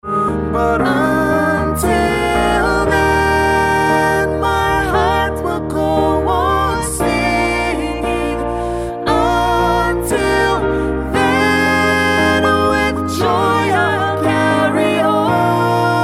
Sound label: ring tone